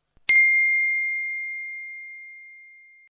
som de alerta iphone 7

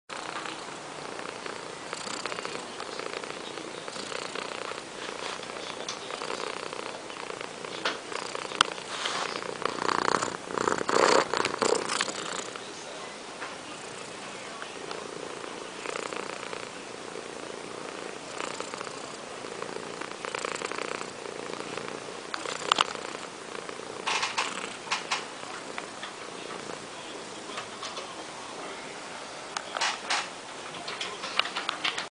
Gato ronronando

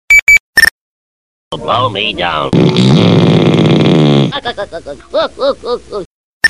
peidos nextel

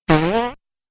som de pum

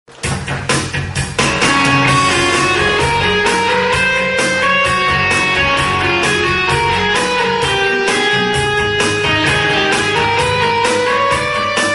hino do brasil rock

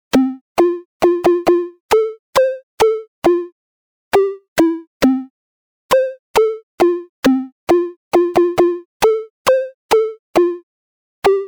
cantiga infantil the wheels on the bus

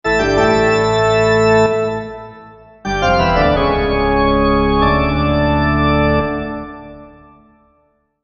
som instrumento assustador

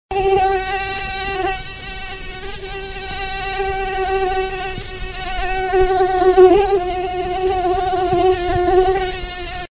mosquito, sons de animais

